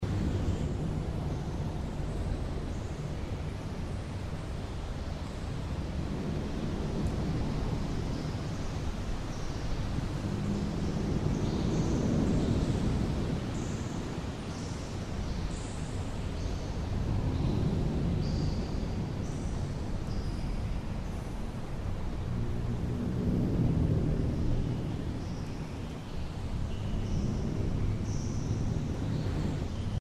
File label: Atmos Cavern